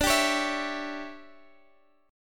D Major 7th Suspended 2nd Suspended 4th, chord, Guitar Standard; DM7sus2sus4; strummed; JGuitar